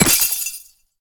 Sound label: ice spell impact shatter; Infernal Radiation